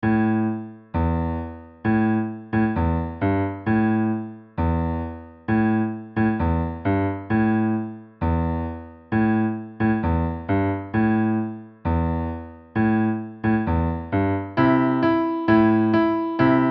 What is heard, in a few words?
66BPM